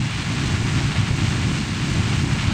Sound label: rocket mini; Kerbal Space Program